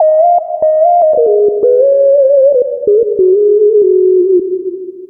SYNTH LEAD; Keyboards; Leads

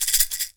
PEYOTE; AKAI S6000 CD-ROM - WorldSounds

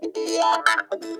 box wah, funk guitar; Zero G - Funk Guitar

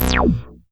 BASS Dikke Sap Bass; Polyend; Legowelt

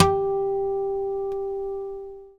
GUI A CHAR G, Acoustic, Roland

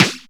Flange Snares; Percussion; Best Service ProSamples vol.37; Dance Synths

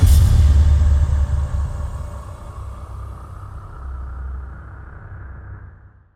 Impact; impact samples

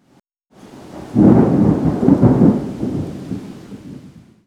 Nature Thunder; X-Static Goldmine

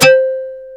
DS8 C 3, Korg

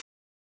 Whistle Long; Megaman Soccer

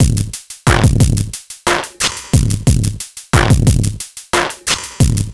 Noise Beat; Drums & Drones

